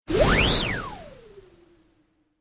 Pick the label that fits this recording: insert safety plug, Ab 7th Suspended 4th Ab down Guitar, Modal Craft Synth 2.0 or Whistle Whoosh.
Whistle Whoosh